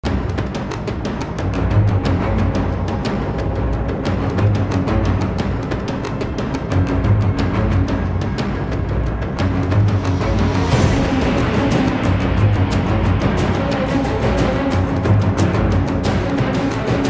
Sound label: Boss Fight; TMNT; General Gato Boss Fight